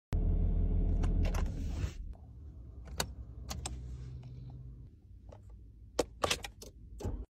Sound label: Pressing car switch